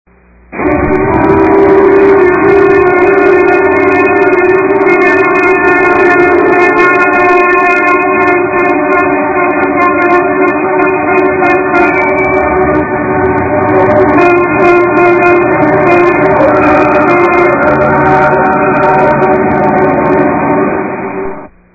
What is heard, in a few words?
Traffic Jam; At the sound of the beep